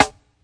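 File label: classic hip hop Snare; Classic Hip Hop Mega Kit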